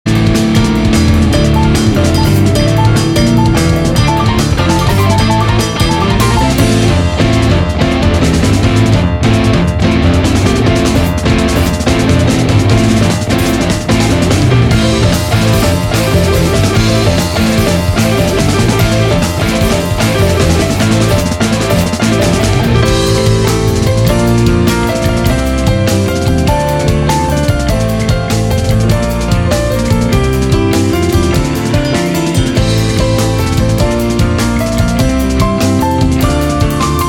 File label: clock pulse